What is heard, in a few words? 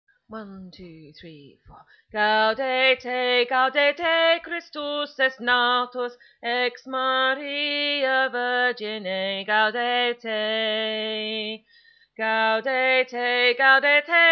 Tenor